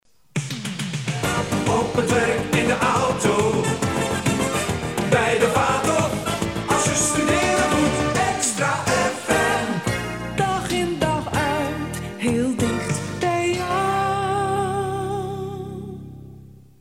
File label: jingle; XtraFM